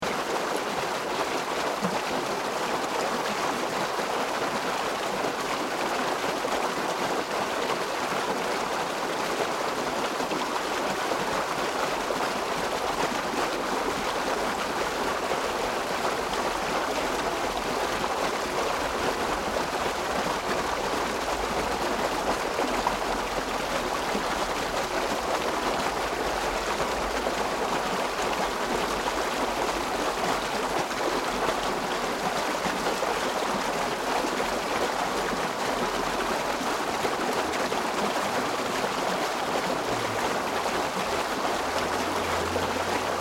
Nature Countryside Waterways Weir Canal Water Behind Sluice Gate Strong Fast Flowing